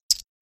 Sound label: chip put down chip